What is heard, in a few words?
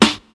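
dope snare drum sound a key, tuned to the A note; snare-drum-samples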